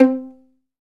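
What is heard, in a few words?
PIZZ VIOL, Solo Violin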